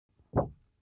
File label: object paper wobble low medium; smartsoundfx